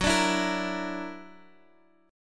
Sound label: Gb Augmented 7th, down, Guitar, Half Step Down